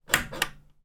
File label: household caravan bathroom sink light switch pull on or off